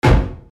monster Step; ProjectZ

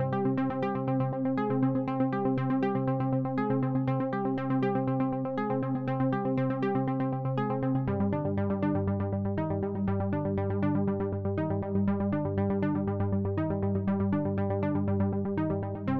synthtrack; SciFi Warehouse Kit

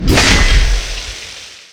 attack, Enemy Sounds; ProjectZ

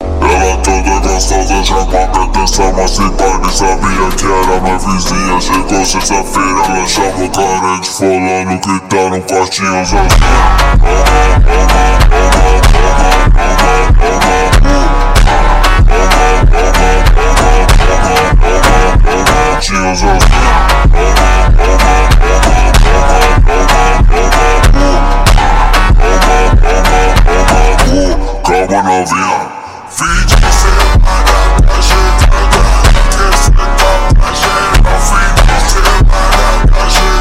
ultra slowed

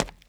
Concrete, Footsteps

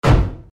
monster Step; ProjectZ

